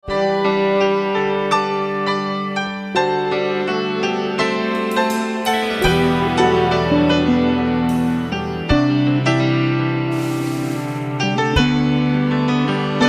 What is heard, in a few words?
swing